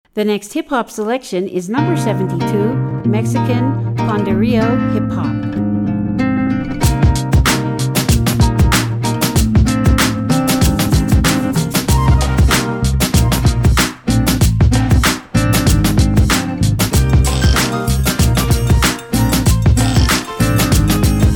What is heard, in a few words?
Mexican Panderio Hip Hop